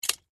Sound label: drag pin